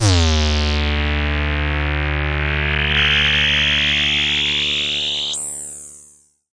SH101 Tone

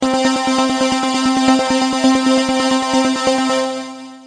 superstrummer santour, synth

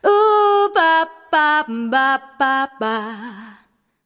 100 bpm Line

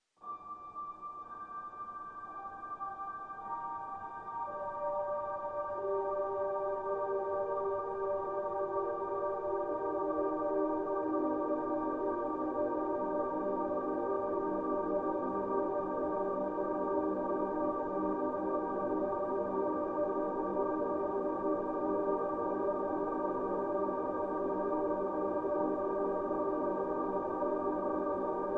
infinite piano; LOOPING AMBIENCE

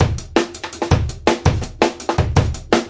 live tricky beat, drum loops and breaks, 165 bpm

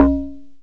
Yamaha DX100 Tom Toms G2; DRUM MACHINES

